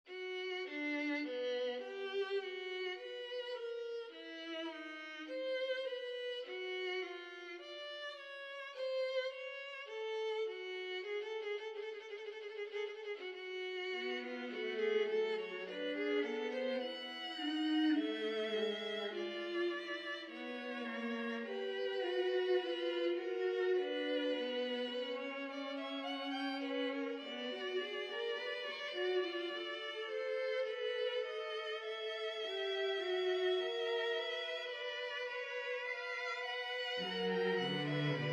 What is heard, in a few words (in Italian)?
per quartetto d'archi; Trascrizione di una Fuga di Bach; frammento